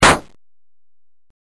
metal

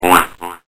quack

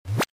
menu pop

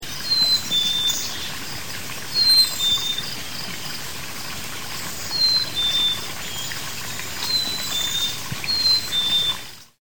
Black capped Chickadee

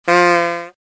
bighorn

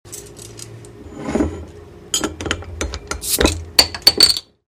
open bottlecap

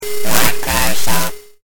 microsoft

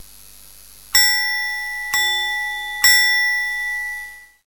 BELL

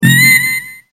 med scan

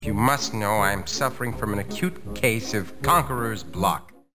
acute block

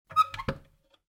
cabinet door open

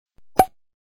champagne

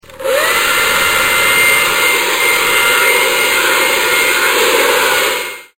hair dryer filter 700Hz

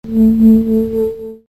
Electric Sound

